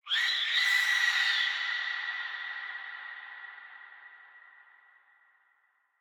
ambience bad death whistle; smite; conquest

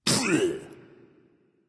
tongue; smite; osiris

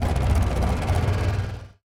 projectile; Smite; god Janus

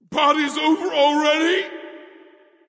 death; Smite; bacchus

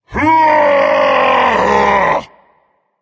battle cry; cerberus

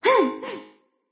yay; smite; kali

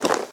armor light; smite; chiron

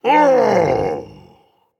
understand ah, dragon; fafnir

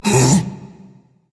confused, berserker; cuchulainn

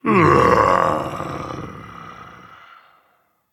Content, Dragon; fafnir

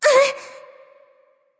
grunt hurt; smite; scylla

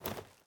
samurai armor; smite; odin

